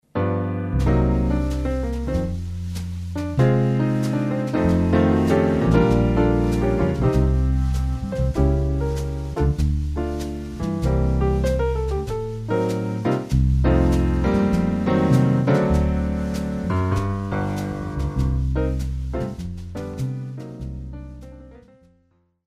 Instrumental

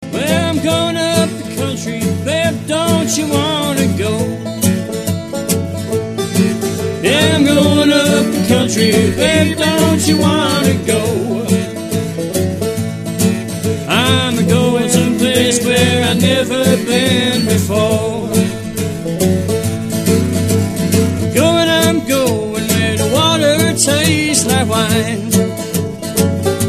acoustic

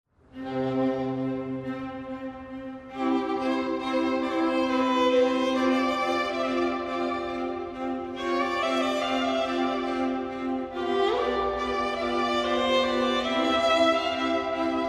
Strings Allegretto from Quartet; Quartet 19

